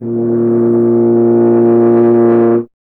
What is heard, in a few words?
tuba; Miroslav Vitous - Solo Instruments VOL-2